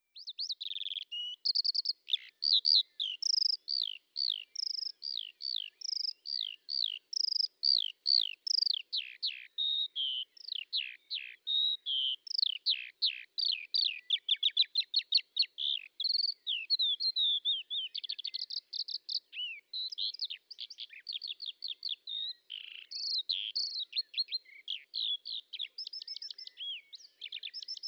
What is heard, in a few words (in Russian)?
polevoy zhavoronok alauda arvensis, zvuki peniya ptits